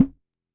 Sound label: Tom Low, Drums; One Shots